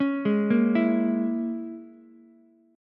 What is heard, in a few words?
Bb Minor 7th Flat 5th, Ukulele; Bbm7b5; arpeggiated; JGuitar